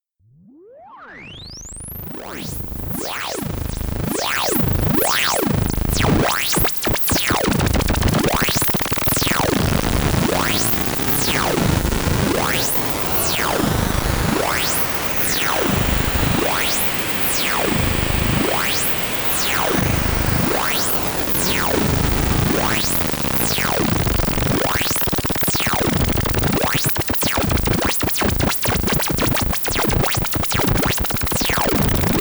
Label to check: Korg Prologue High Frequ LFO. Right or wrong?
Right